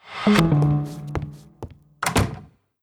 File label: gone